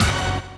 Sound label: brass stab